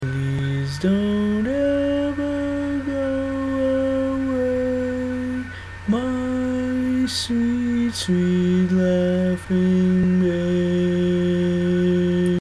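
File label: baritone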